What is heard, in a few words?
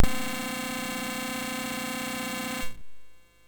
sid MOS 6581R2